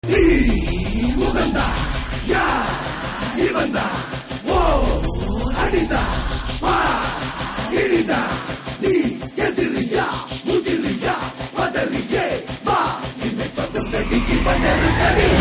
Theme Ringtone; Nerkonda Paarvai

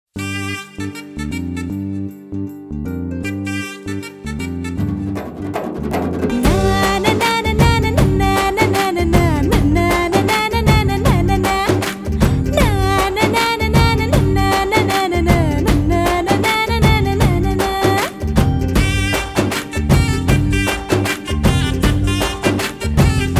Humming Ringtone

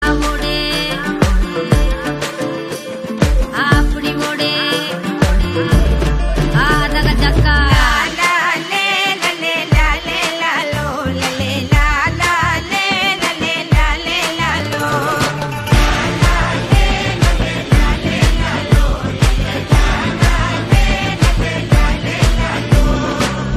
BGM Ringtone

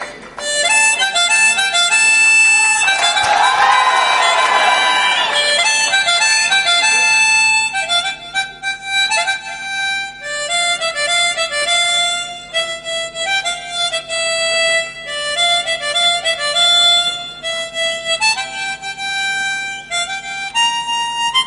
Flute Ringtone; Bommarillu

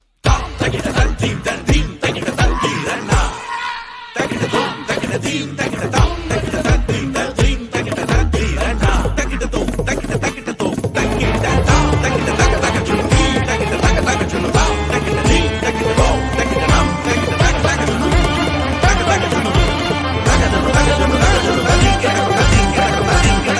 Tabla Ringtone

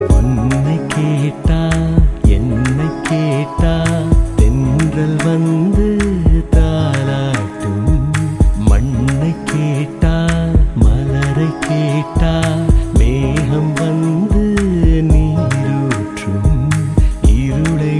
Clap

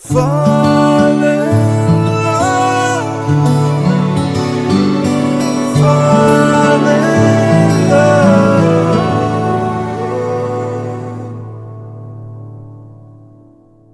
Fall In Love Bgm

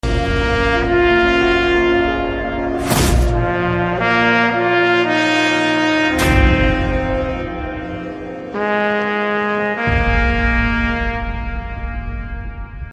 Trailer BGM Ringtone; Batla House; Independence Day

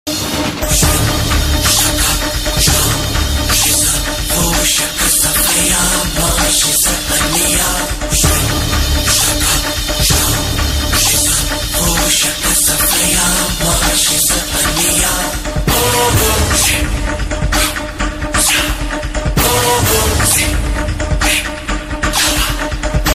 Theme Music Bgm Ringtone; Anniyan

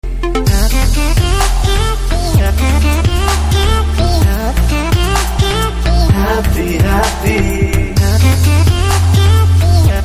Ringtone

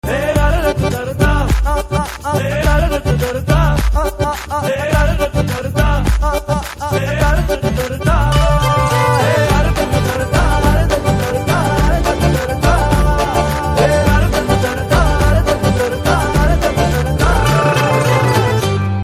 BGM Humming Ringtone

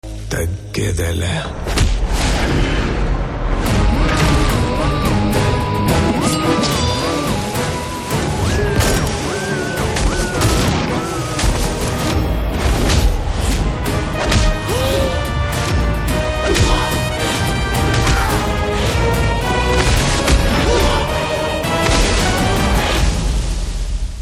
Fighting BGM Ringtone; Pushpa Raj

